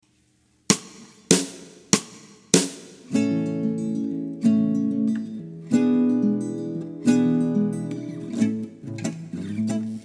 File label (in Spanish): 2 guitarras con clik